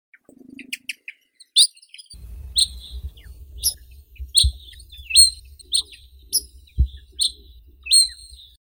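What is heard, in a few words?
saxaul sparrow, Passer ammodendri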